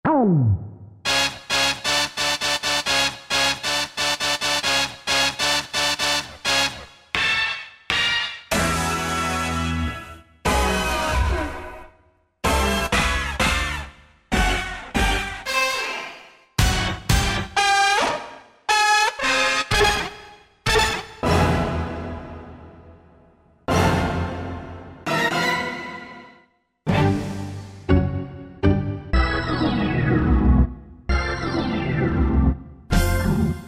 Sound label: hit specials; Roland SRX-05 Supreme Dance